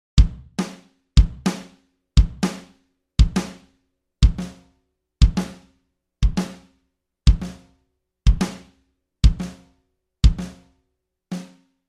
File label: drum groove